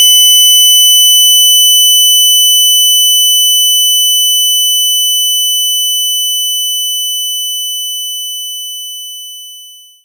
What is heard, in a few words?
Saw Decline 3000Hz; knights try